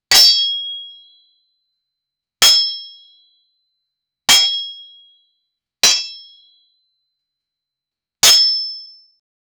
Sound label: sound of a sword hitting; weapon